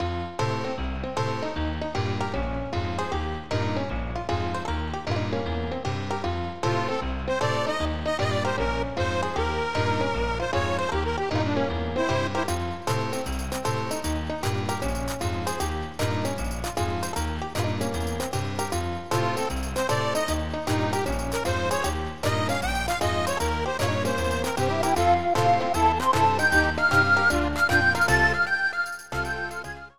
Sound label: Going Shopping; SMRPG; Ripple Town; List of Super Mario RPG: Legend of the Seven Stars media